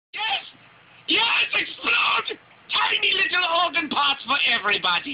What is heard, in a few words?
Organ Parts